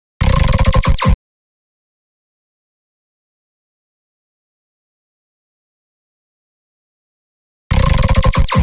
Sfx, Am, 128 bpm, Complex Electro Synths; Prime Loops; Speakerbox

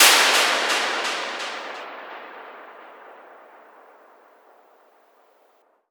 FX Impact

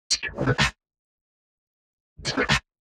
126 C VOCAL LOOP, Funky Underground; Loopmasters; Funky Underground Vol 2

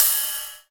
Ride, Cymbals

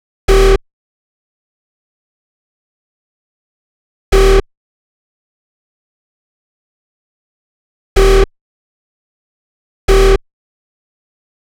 125 G Bass, Complex Electro Synths

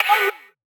G# VOX STAB; funky; Loopmasters; Funky Underground Vol 2

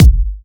Bassdrum

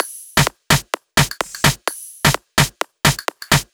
Loop, 128 BPM

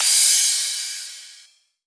Crash, Cymbals; Mutekki Media; Vengeance Electroshock Vol.2

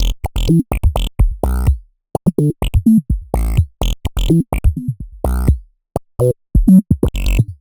126 E BASS, Funky; Loopmasters; Funky Underground Vol 2; BASS LOOPS

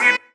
B STAB, funky

